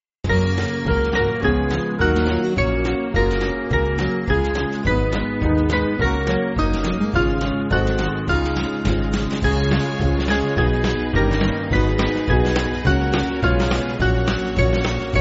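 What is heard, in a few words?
Band, Backing Files for Churches; Theres A Wideness; St Mabyn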